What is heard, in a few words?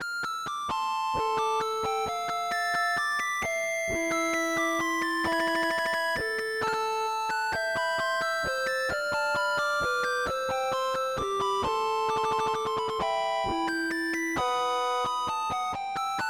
courante